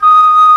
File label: TRON FLUTE; E-MU Formula 4000 Series Vol. 5; Protozoa